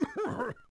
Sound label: Cough; Civ4